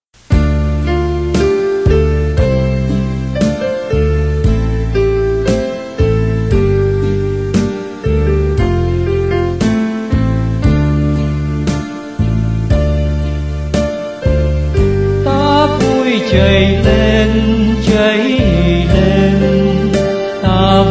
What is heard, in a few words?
BASS